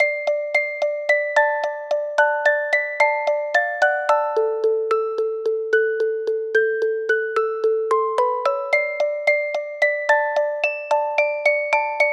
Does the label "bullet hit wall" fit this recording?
No